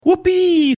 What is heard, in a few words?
whoopie